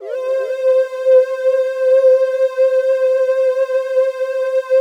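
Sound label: PAD, atmospheric pads; Best Service; XX Large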